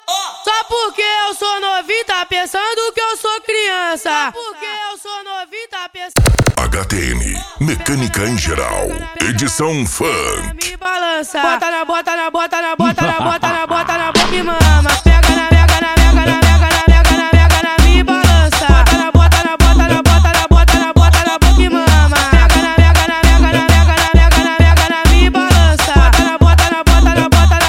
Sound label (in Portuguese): mecanica em geral edicao funk